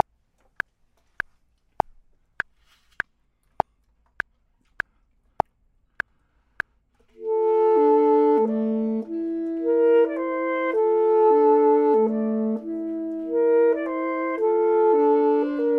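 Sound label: both, Saxophone and Clarinet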